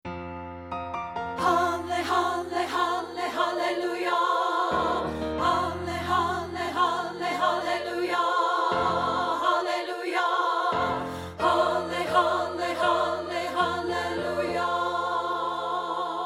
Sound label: alto